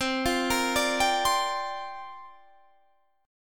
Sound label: C 7th Suspended 2nd Suspended 4th, Guitar, Open D